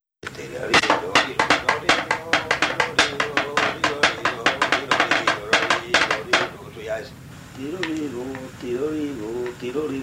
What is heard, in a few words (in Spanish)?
La danza y entradilla de Cigales; Bailes tradicionales en Valladolid; Carlos Porro